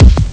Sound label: bass drum glup; Amiga Soundtracker Sample Packs